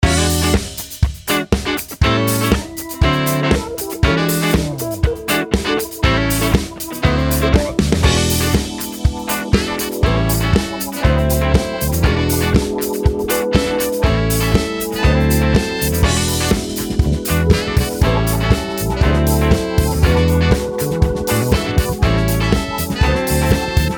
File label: Funk Soul Ensemble